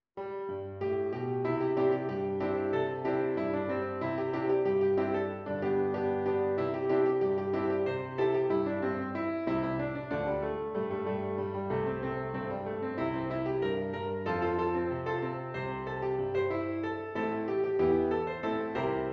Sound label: Exit Music Non Vocal